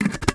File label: Plastic Lid, Kitchen